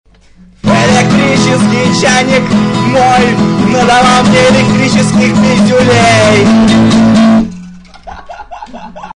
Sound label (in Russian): Elektricheskiy chaynik